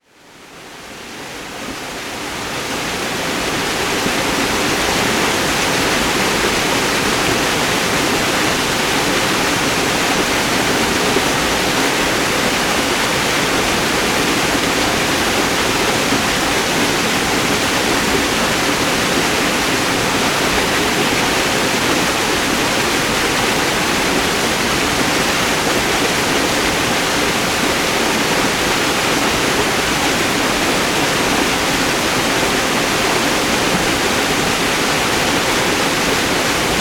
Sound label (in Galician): Exterior Auga Correndo con graves